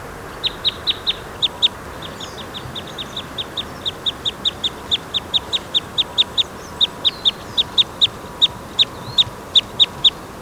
Red Crossbill Type N02 - FCs

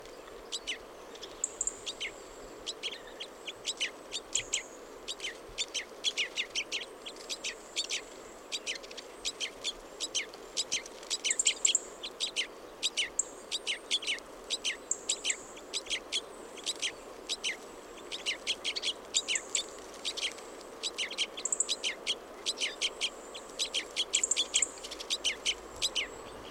Type N04 - BCs, Crossbills

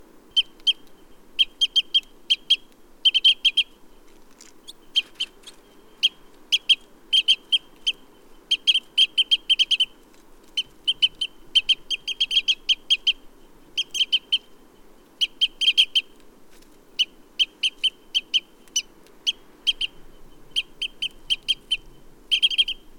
Red Crossbill Type E02 - ECs

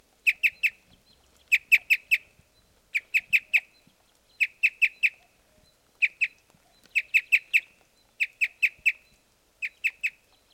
Red Crossbill Type S06 - ECs

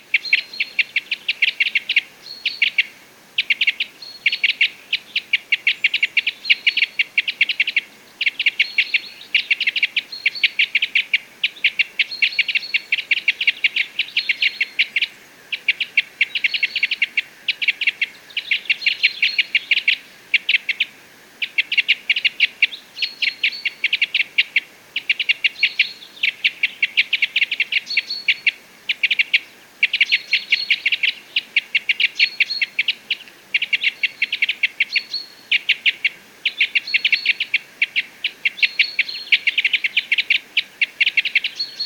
Parrot Crossbill Type N21 - ECs

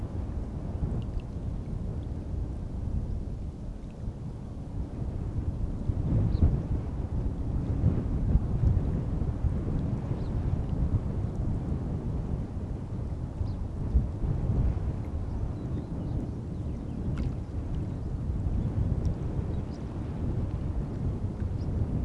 Richards Pipit

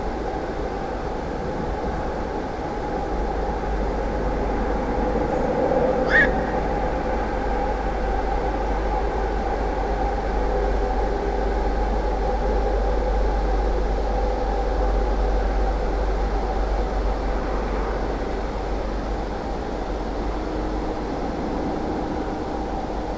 Black-crowned Night Heron; Eriskircher Ried

